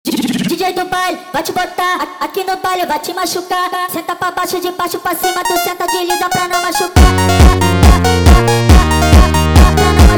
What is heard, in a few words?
Montagem sped Up